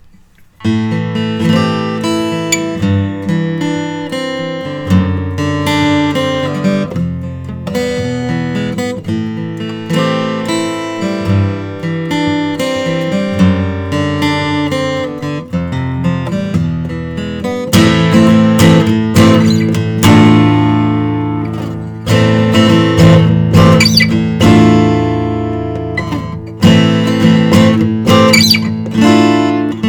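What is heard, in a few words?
pirate triple 0, guitars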